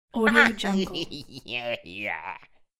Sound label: psycho male laugh; human sounds; خنده مرد روانی